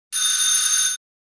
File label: Clockwork doorbell loud ring